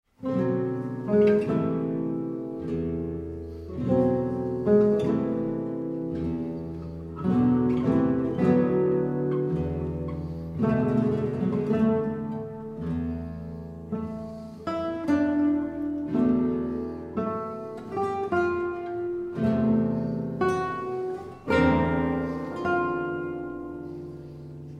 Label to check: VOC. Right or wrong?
Wrong